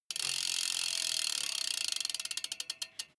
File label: bmx bike freewheel push and slow down stop